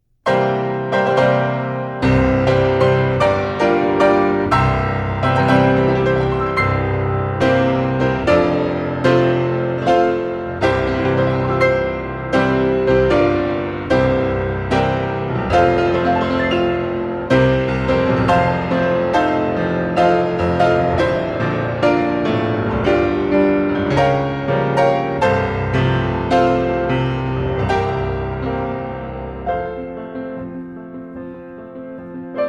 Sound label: happy birthday variations